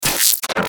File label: Glitch Static Warble, Glitch Fried Zap; Production Element Imaging Element Accent Transition; Blastwave